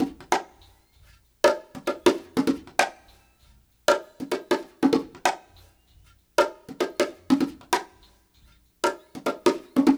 CONGAS, Funky Ass Loops; East West

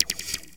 ZAPS HAT, Techno Trance; E-MU Formula 4000 Series Vol. 2; OrbitPercMaster

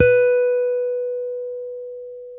STEEL, Guitars

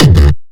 bass kick

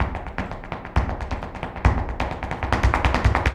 Flamenco, ZAPATEADOSOL

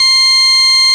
Farfisa organ C4; Sonic Reality; Vintage Timetraveler

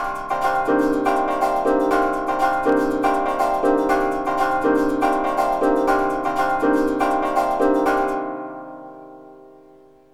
Trance SYNTH SEQ, 120; AMG; Global Trance Mission VOL-2

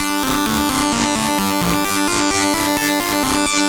Jen Pulses B 130 D, modular 130bpm; modular madness

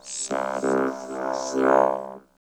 VOCOD, Tekno Industrial; East West

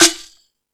Percussion FX, E-MU Pro-Cussion

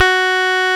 Roxichord; E.Piano; Roland LCDP10 Keys of the 60s and 70s 2

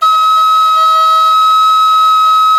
D#6, REED FLUTE; SoundBytes - Early Patches

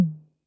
Tom Mid Accent Max Decay, 808

